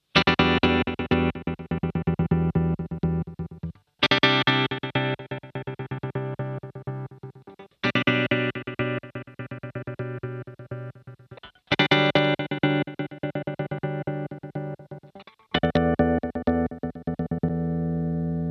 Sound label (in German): Slicer Beispiel